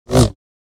Saber; FXHome

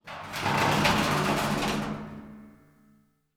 Corrugated Iron Sheet; 99Sounds; The Warehouse

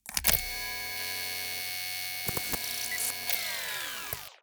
Energy handheld generators

